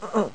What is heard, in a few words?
UHG